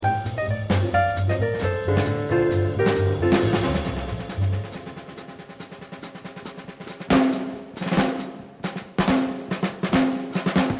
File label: drum solo